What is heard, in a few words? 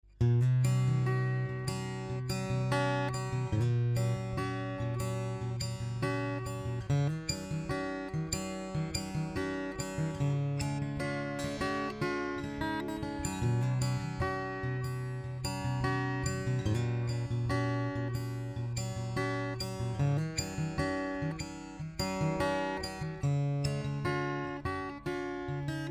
114ce DI Fingerstyle; LTD